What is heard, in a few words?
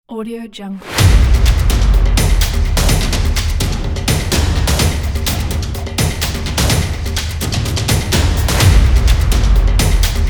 epic percussion; calmsound